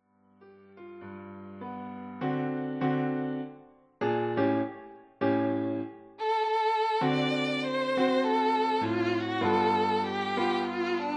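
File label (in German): Violine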